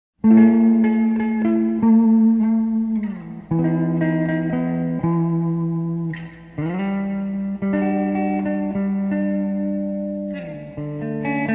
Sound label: Engine Rain